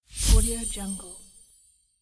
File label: christmas magic opening